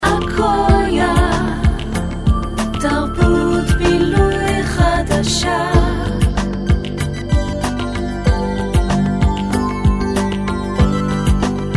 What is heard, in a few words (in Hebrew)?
גינגלים